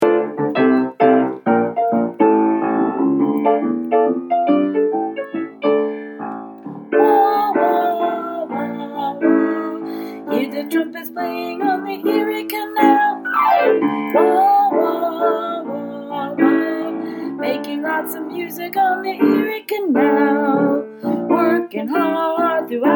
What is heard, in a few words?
vocal